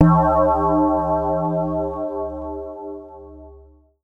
C#, BELL GONG, Synclav FM; Big Fish Audio

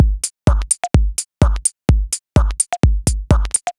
C# 127bpm, House Drumloops; Zero-G House Fabrik Drumloops; ZG Drumloops

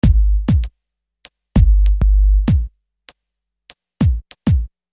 Beat, Hip-Hop Street Beats

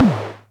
Percussion Computer Tom

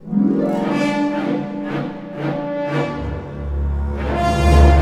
Orchestral Loops; 128 PSYCHO

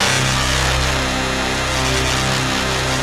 basses; Wizoo - Powered Wave

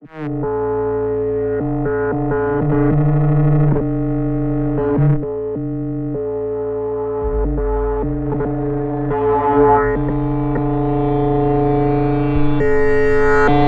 texture datajack, drone pad texture

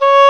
C#4, Oboe, AKAI S-1000

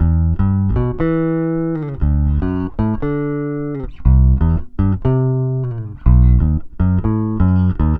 SA BS EM, BASS SALSA

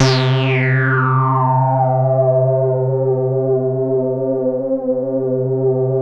MONO PO, LEADS

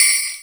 BELL HIT, drums; Big Fish Audio; Synth City

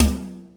Sample, Roland MV8800, POWER KIT

